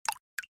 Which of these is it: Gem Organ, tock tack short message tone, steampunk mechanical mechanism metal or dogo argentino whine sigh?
tock tack short message tone